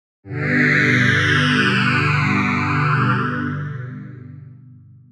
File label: Phased Dirt, jup8